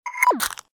Wine crunch

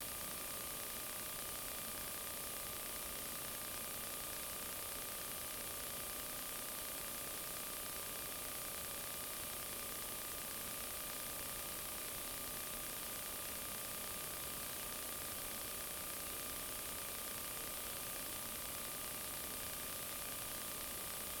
VHS Video Tape Noise Crackling Servo Playback; Artificial

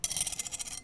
Kitchen

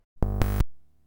Plug In

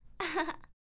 Girl Laughing

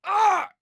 Male Scream

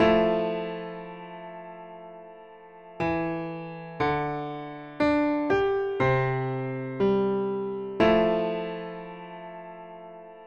Piano 120bpm

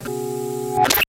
Sci Fi Glitch Transition Swoosh

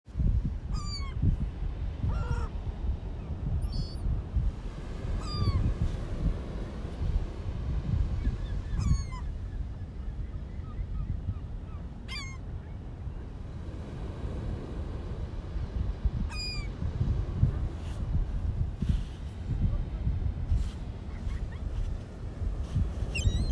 Seagulls Coney Island

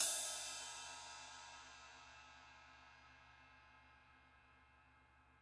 Ride Ping Bow

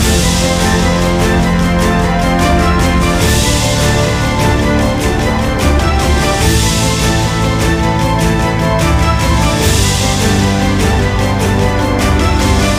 Blockbuster Trailer Loop

